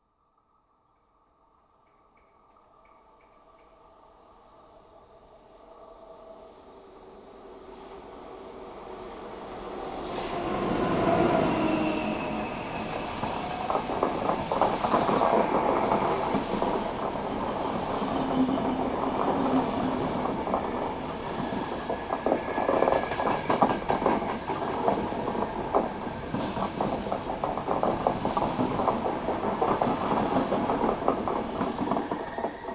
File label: Train long Rode